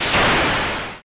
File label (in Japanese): zushaa